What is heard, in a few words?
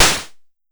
Snare, Pollard Syndrum